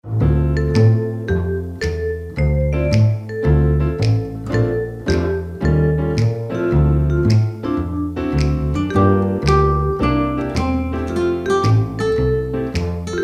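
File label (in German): Christ ist erstanden, Strophe 2, jazzig, Hörbeispiel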